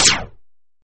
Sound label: Noise zap